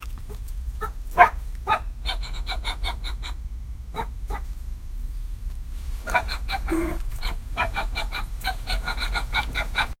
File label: sound of weak, injury